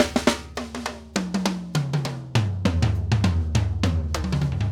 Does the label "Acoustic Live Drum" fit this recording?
Yes